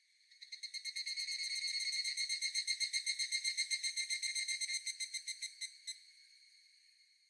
Ambience Cicada; Devour; The Inn

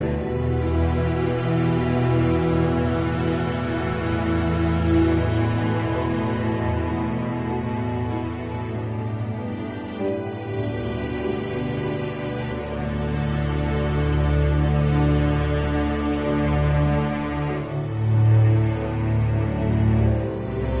Loop Neutral

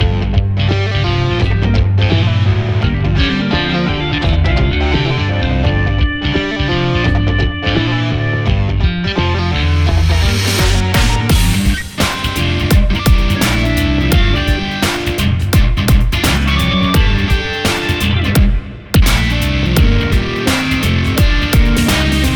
Fork in the Wall Rock Ver.; No More Heroes 3

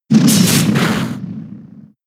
Metal Claw; Pokemon; gen 6; XY; ORAS

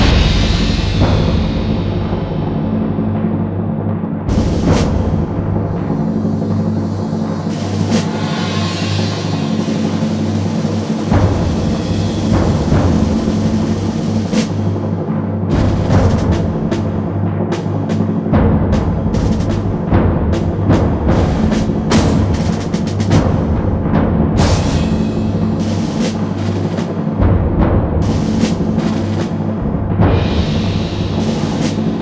Perc Loop; Stronghold

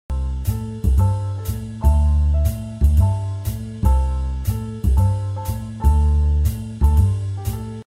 Relaxin Jazz Loop; golf gang linux windows gamerip 2022

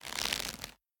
Leather Foley; The Town

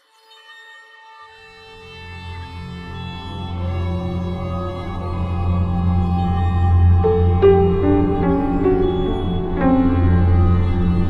Short Music Mystery; Solus Project

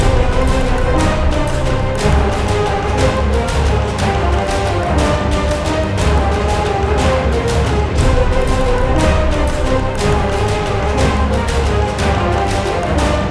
Nature Loop; Jotun

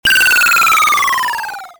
Falling Sound Arcade; Doodle Jump; Android; Xbox 360

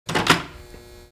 press pult big handle

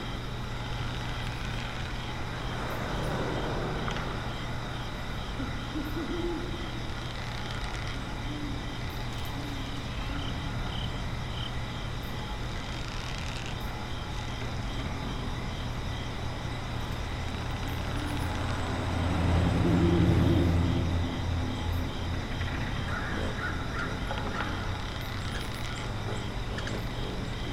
Ambience Warehouse

